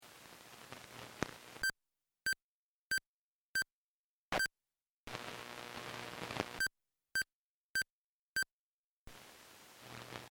Sony Walkman Static And Digital Tuner, Digital beep; Digital beep / click with bursts of static noise; Static Sound Effects